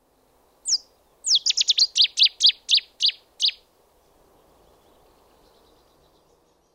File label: cettis warbler; Rainham